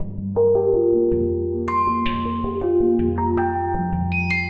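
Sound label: Driving Mallets, G minor 80 bpm; MIDI Clips; Tonal; Motifs; Ableton; Factory Packs; Mood Reel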